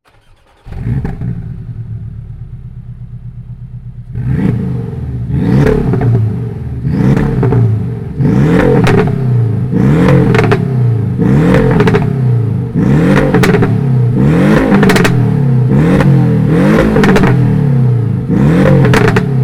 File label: exhaust 718 Rev Open Valve - Closed Valve; TECHART